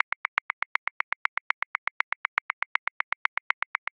Highlife Clave Accent 16ths; Grooves; Ableton Live 12 Lite; Core Library

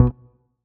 Bass Pluck B1

